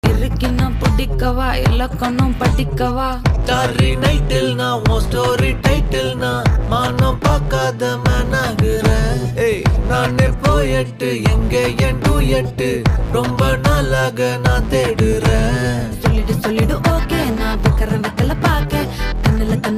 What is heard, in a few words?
song tamil